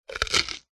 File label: cartoon bite crunch; zapsplat